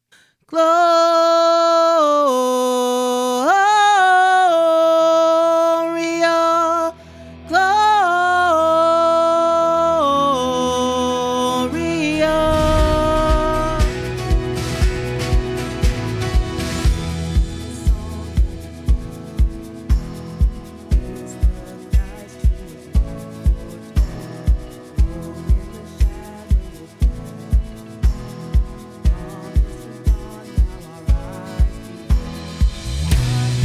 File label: Alto w Lead, christmas choir